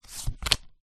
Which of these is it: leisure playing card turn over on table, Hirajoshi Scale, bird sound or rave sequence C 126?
leisure playing card turn over on table